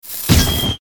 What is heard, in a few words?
firework cast; abilities